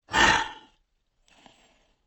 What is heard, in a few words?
dinosaur rumbling breath; SoundsCrate